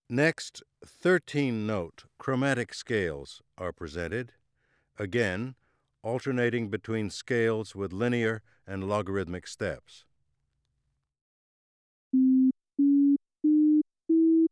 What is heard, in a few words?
Logarithmic and linear frequency scales